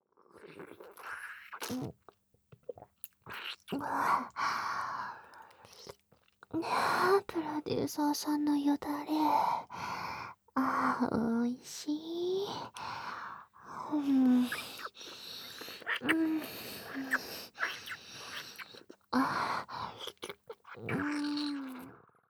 ラブラブべろちゅー; プロデューサーさんのよだれ-美味しい…♪

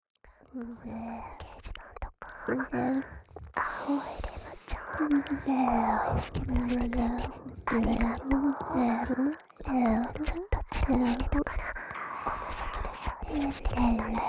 リコーダーちゅぱちゅぱ; セクハラコメント朗読♪; オナホコキ♪

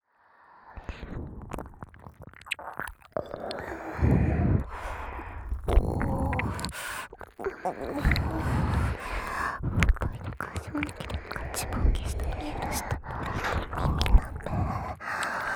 両耳舐め; 即びんびん♪下心隠す気ゼロで大興奮お猿さんぴゅっぴゅ♪

